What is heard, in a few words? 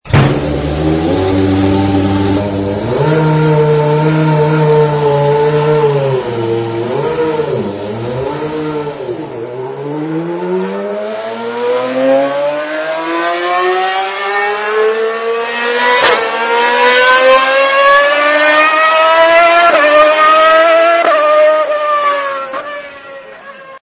f1 ferrari boxes